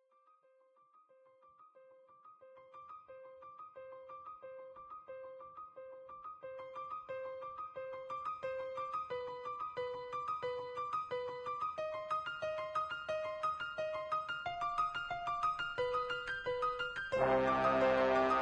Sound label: music; source engine; tf